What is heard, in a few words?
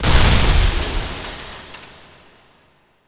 explosion